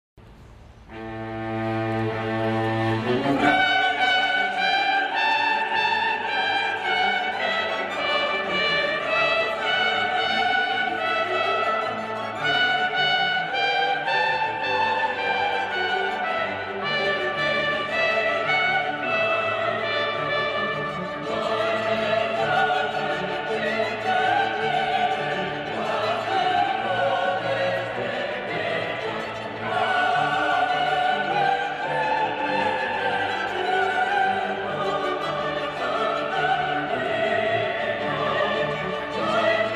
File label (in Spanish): CUERDAS Y CORO TROMPETA; ODA